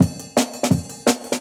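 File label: Jungle Breaks, 170 bpm; 90 s Sample CDs; Original Jungle Breaks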